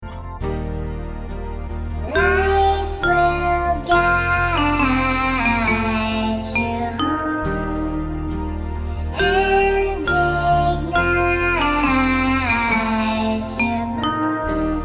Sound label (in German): Alt nur Chorus; cantaarte advents und weihnachtslieder 2025